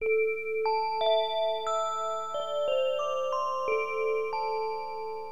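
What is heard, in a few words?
night bell, keyboards; HipHop; LiveAct Pool 1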